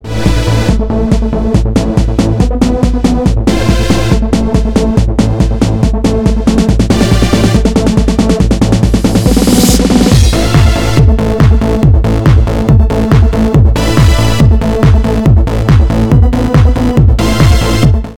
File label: Trance; Dancefloor